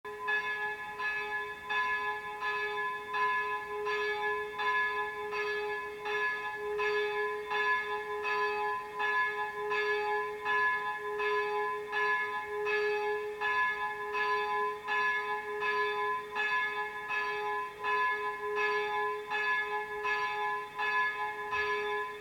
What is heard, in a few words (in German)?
Glocke; dom st martin rottenburg